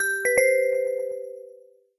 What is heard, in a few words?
Swing, notifications; Honor; X7a